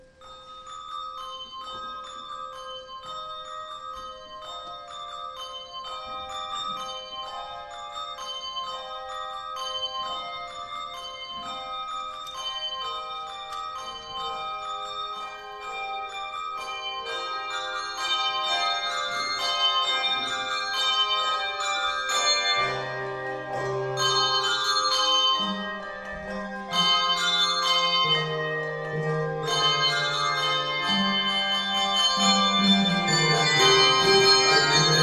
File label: Carol of the Bells; Savior's Soundwaves